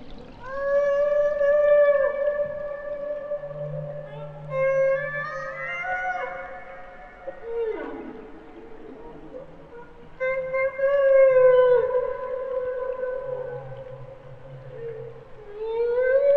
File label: H Back whale excerpt